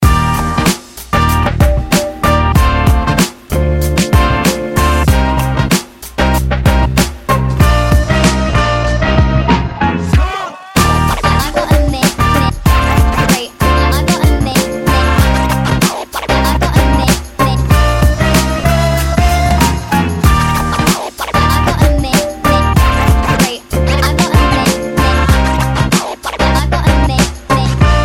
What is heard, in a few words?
basketball music